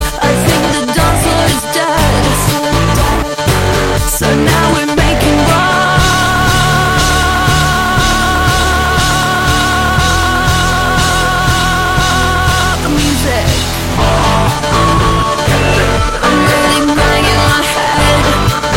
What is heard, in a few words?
Rock Music; ringtone